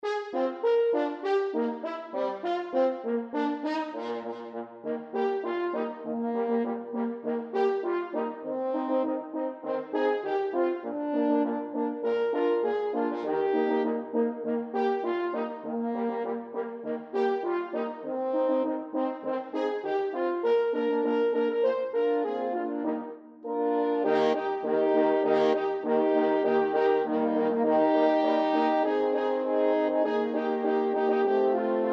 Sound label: Jingle Bells